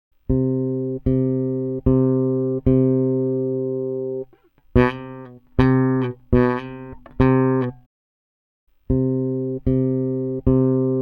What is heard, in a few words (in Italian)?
Suonare il basso suono pulito suono sporco